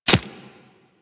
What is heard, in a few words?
Player Arrow Impact Flesh; Warframe; Weapons